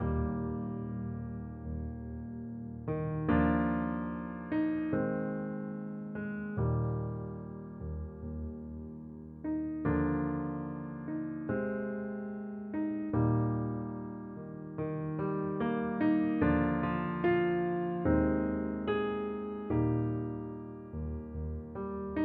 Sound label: Studio Grand; Americana; Standard Preset